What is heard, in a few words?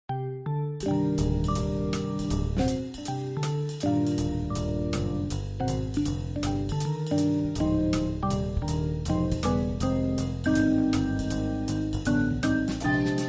percussive smooth jazz